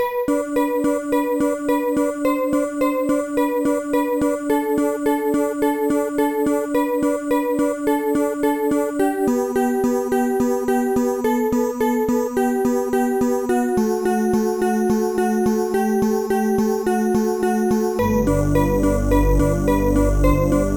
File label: waves of melody; MED